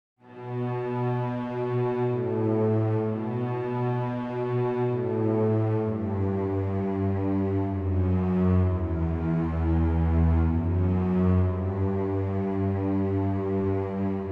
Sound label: Compass Strings Slow